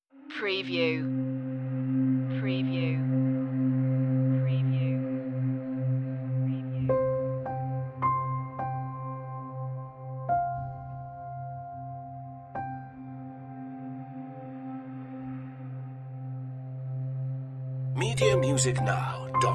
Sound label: relaxation no sound fx